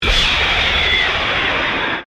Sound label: Distortion Scrape, Electrical; Production Element, Imaging Element, Accent, Transition; BlastWave